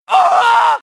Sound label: voice female ahhuu